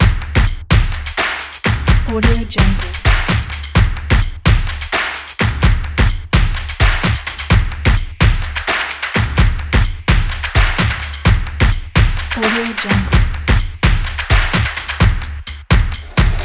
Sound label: the percussion